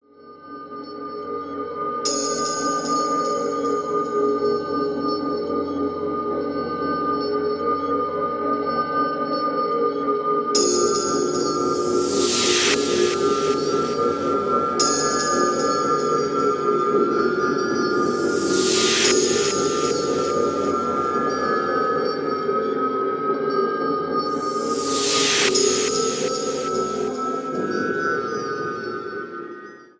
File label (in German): musik hoerprobe